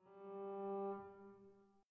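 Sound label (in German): Variation 20 für 2 Clavier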